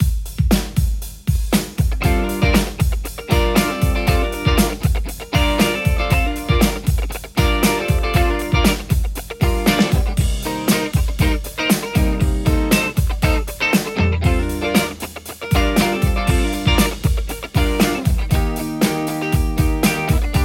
lespaul hybrit; poulin

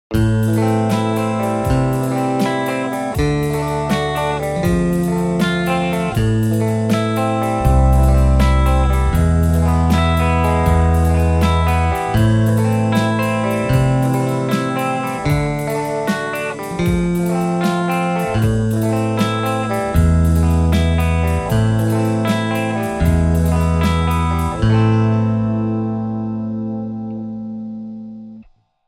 lespaul tomato; mercuriall

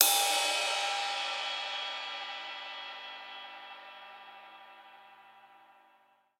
drums ride

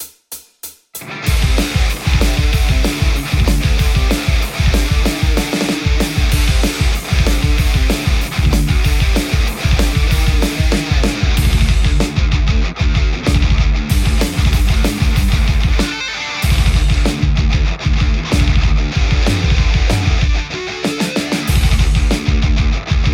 metal tele; igniteamps; emissary v1 00

